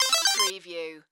Multimedia Chime

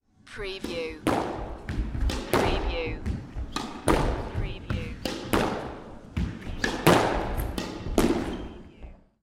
Sports Squash Rally

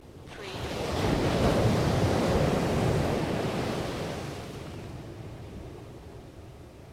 Short Wave Crash Loop; beanstalk audio

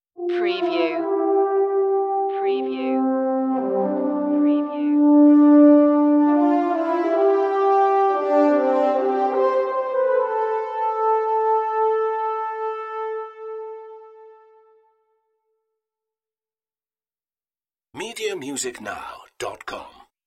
big moment horn theme

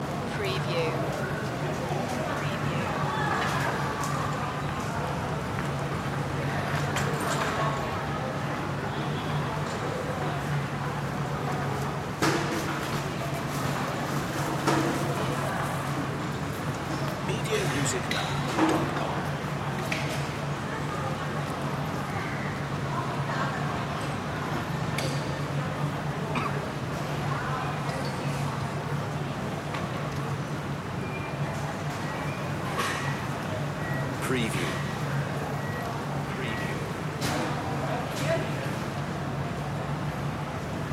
backyard shopping street loop

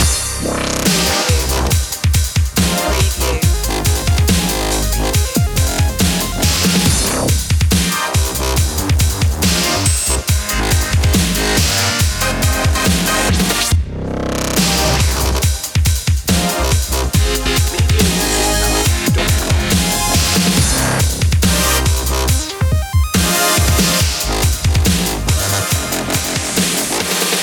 dubstep

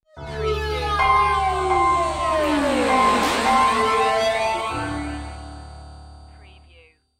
Dark Production Stinger

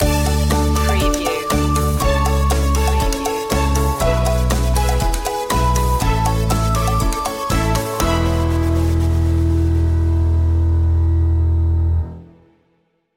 walking on air loop end

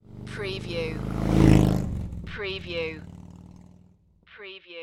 Motorcycle Harley Davidson Drive By Left To Right

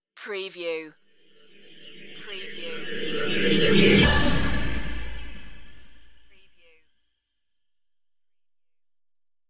rising pulse hit

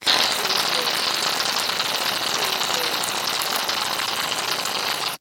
Mousse Spray Hair

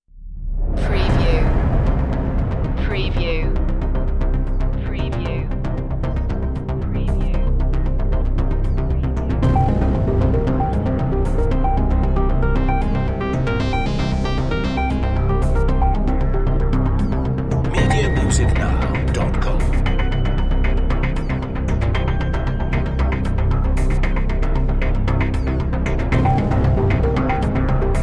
underworld series dramatic cue

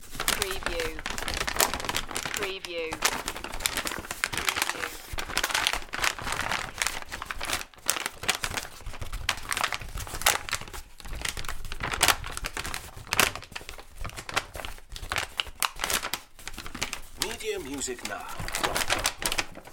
Paper Crumple

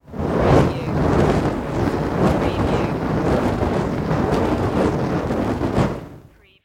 Torch Movement Whoosh

